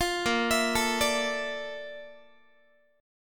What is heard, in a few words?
A sharp Major Sharp 11th, Guitar; arpeggiated